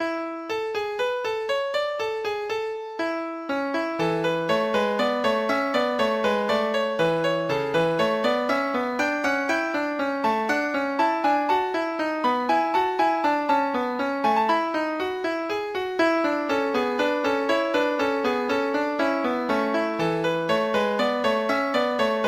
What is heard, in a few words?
bourree